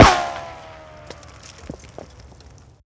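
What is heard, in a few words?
ricochet, weapons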